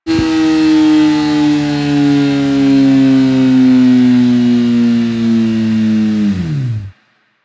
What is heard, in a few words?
Engine Disengage, Motorcycle; Motorcycle Ninety Nine